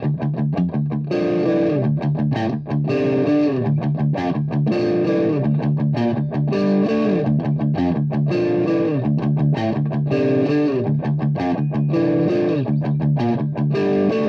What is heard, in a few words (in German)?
Verzerrer Pedale Mid Gain; Soul Food